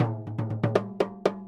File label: medium sticks, Drum loops